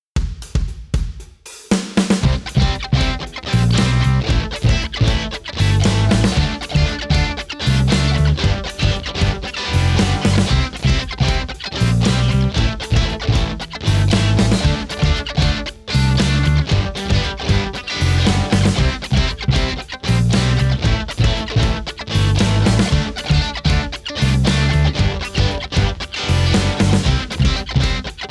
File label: Crunch